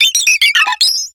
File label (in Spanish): Grito de Starly